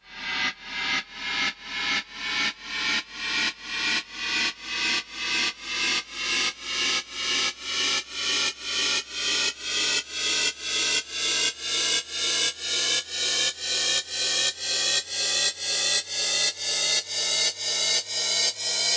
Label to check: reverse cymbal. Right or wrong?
Right